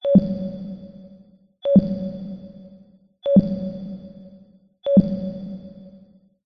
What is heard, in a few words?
science fiction sonar pings; zapsplat